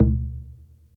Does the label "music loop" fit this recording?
No